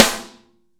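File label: snare ambient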